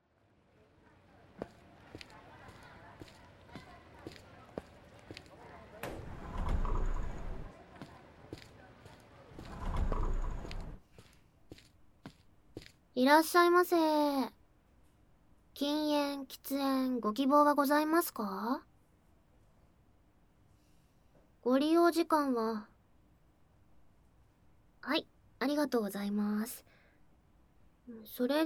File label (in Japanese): 耳舐め; 手コキ／いじりあい; 裏オプションのあるネットカフェ; 高音質バイノーラルKU100